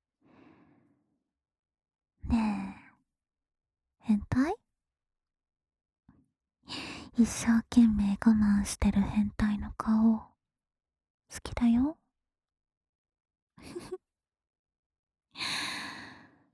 シコ無し 密着耳舐め; シコ無し版; レベル4「密着耳舐めが開放されました」; ドS女子を育てるゲーム1; レベルアップ式育成ゲーム

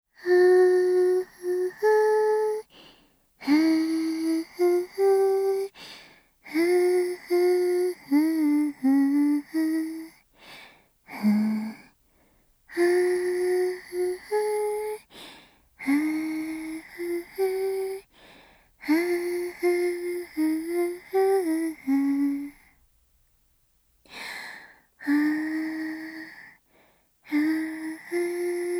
子守唄 左のみ

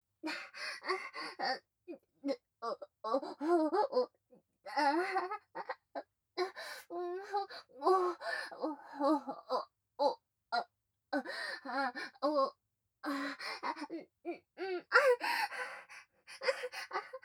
喘ぎ声・激しめ

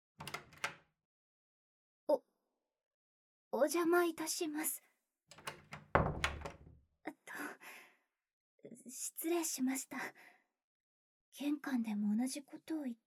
耳かき; 穂菜美; 大和撫子な1人暮らしお嬢様ASMR; しょにおや!～いっしょにおやすみプロジェクト～

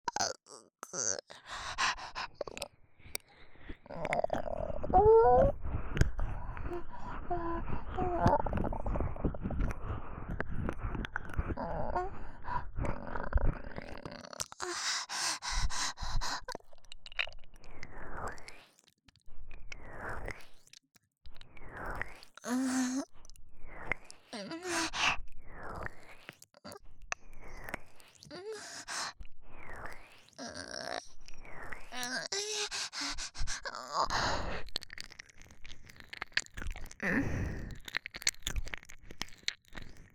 左耳舐め＆喘ぎ; 新人キャバ嬢; アドリブ集

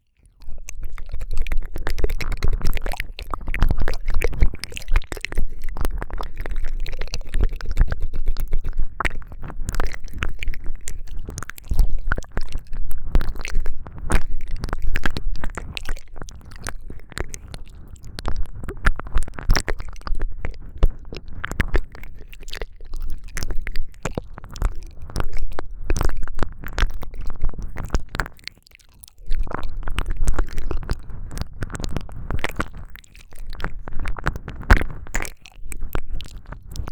たっぷり舌を動かす耳舐め(両耳同時ver)

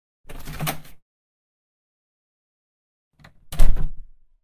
耳ブラシ（右耳、SEのみ）, ASMR

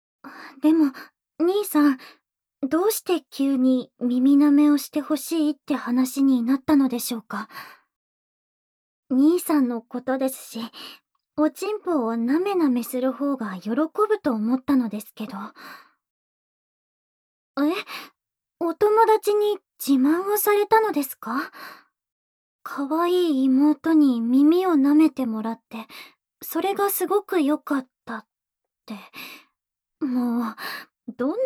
おまけトラック・耳舐め左耳

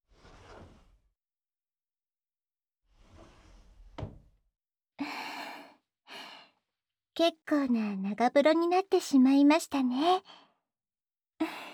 女の子の吐息, 右耳ふーふー, ASMR; 耳かきリフレ『春乃撫子』; ロリ店長; 温泉旅行

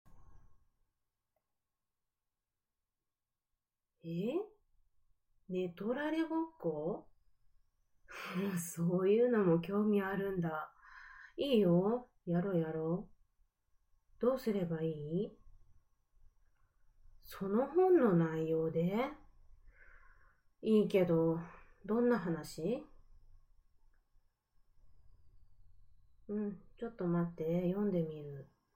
ちん負けオホ声立ちバック; オホイキ!下品で爆乳なダウナー系JKの雌汗フェロモンで自堕落性生活～いつでもヌけるメス穴～

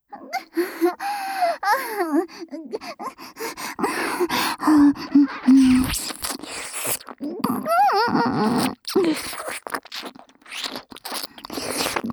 デレあま密着正常位の耳舐め＆耳元喘ぎループトラック, ASMR; スクールカースト上位の生意気JKを催眠分からせ調教

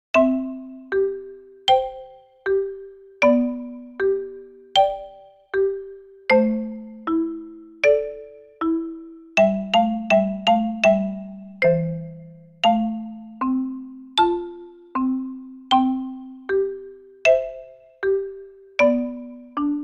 イメージ曲 marimba; ２冊目 ～夏～

